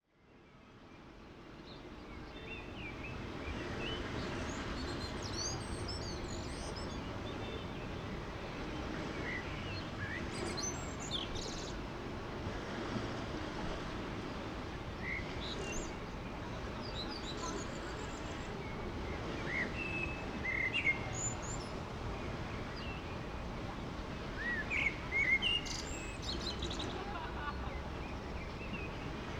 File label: bells, evening bells in Italy; Moneglia